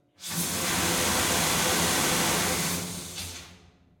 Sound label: Steam Blast, Tube or Pipe, Distant